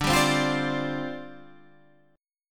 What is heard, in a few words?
C Suspended 2nd Suspended 4th, D